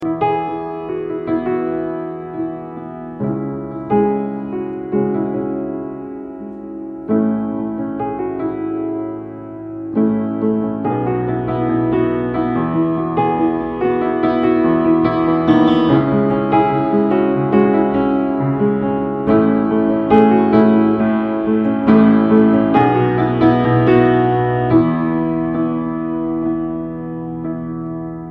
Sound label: PIANO RINGTONE